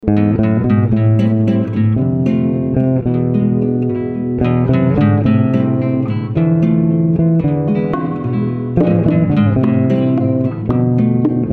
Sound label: Bolero